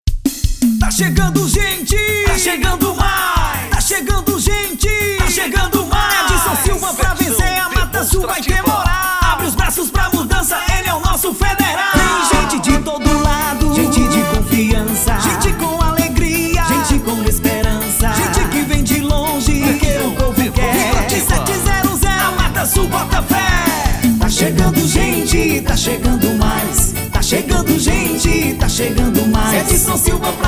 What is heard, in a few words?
JINGLE POLÍTICO; TA CHEGANDO GENTE